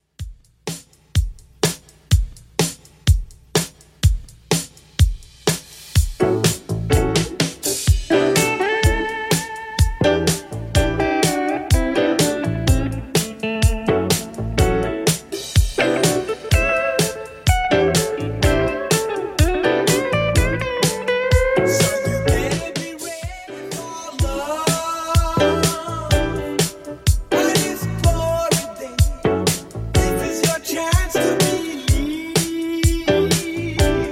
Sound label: 80s Redrum 125bpm; remixplanet